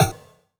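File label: GROOVE snare drum, Drums Dance & Groove; USB Soundscan vol.11; AKAI; GROOV KIT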